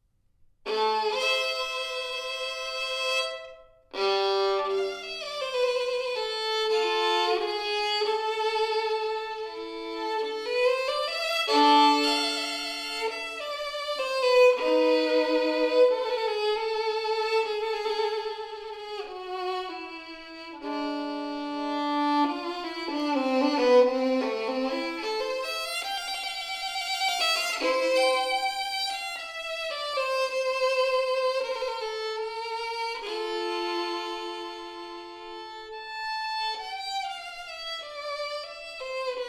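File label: Prelude a minor, violin; Bach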